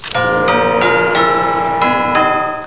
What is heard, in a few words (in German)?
glocken